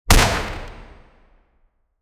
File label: a single close up shotgun, blast